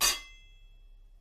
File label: metal hit